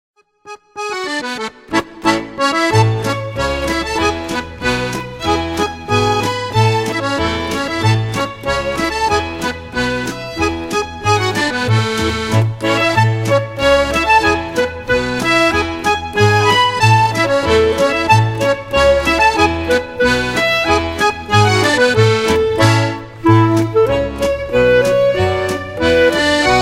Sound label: Polka